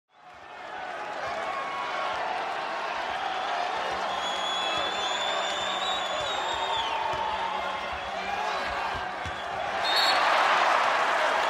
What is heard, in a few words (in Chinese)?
运动比赛赢球欢呼声喝彩声裁判口哨